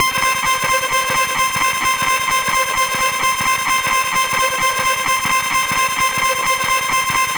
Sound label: SYNTH BLEAM